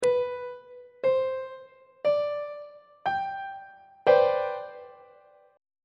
d double flat major 7th suspended 2nd 3rd inversion on treble clef